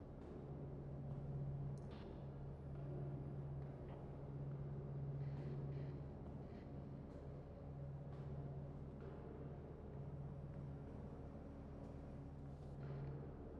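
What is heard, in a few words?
amb icebreaker; new year 2025; ledokol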